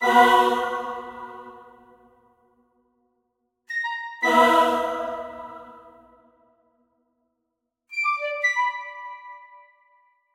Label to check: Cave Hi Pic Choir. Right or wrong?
Right